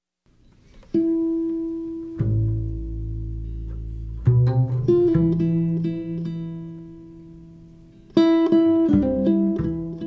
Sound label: 18" Engelmann Spruce / Big Leaf Maple Archtop; Ben White